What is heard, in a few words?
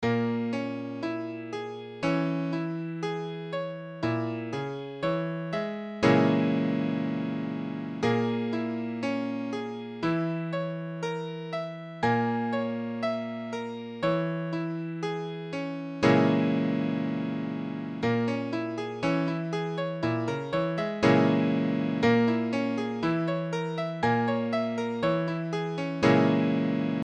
Bbm7 5b, piano